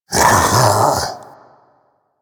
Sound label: Infested Splitter Split Vocal Alerted; Warframe; Grey Strain